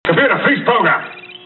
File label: freeze